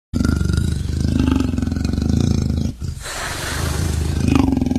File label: Leopard; Jungle